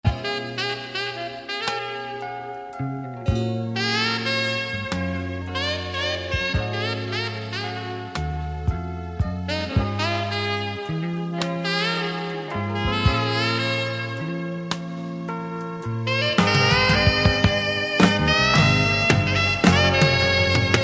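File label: saxophone with drums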